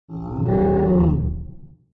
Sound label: hit; shouLieGaoShou350; xiniu